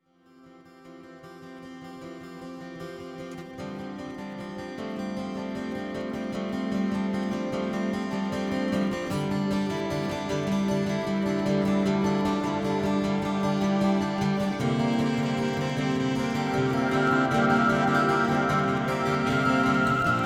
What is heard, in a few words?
Guitar Trio